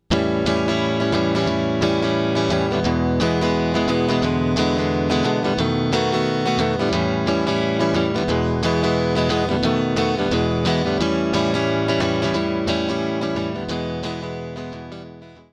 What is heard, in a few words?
blues prog